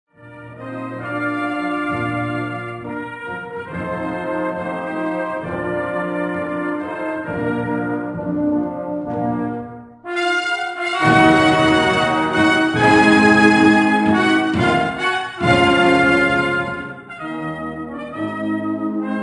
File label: Funeral March